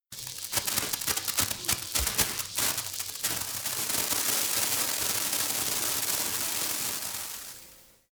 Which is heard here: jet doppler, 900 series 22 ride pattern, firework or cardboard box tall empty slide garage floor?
firework